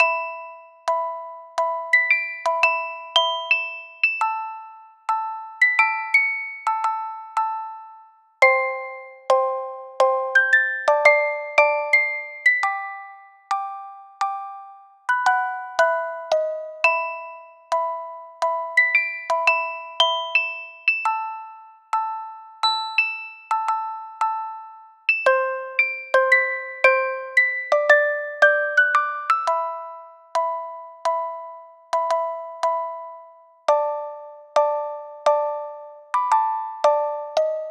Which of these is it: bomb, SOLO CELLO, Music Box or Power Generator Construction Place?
Music Box